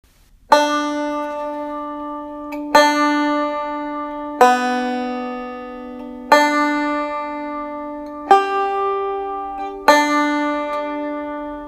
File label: ringing, banjo